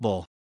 BOWL

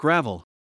GRAVEL

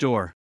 DOOR

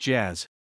JAZZ

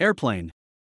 AIRPLANE

